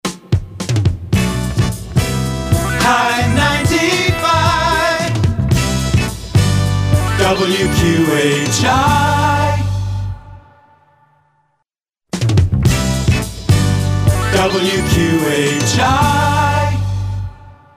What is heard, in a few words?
Stereo Rock Jingles; WQHI Undated TM